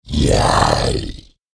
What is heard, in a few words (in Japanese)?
クリーチャーボイス